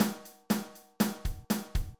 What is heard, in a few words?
drums example, midi; I've made variations in all of the velocities to try to get a bit more realism into the sound